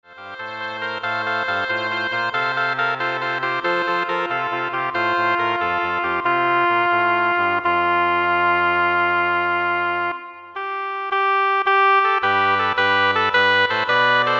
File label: Double Reed Ensemble